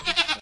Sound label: goat